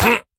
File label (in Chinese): 卫道士受伤声